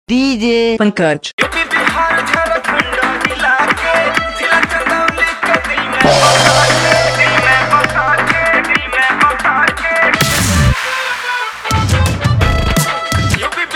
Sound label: Hard Rock bass; DJ Pankaj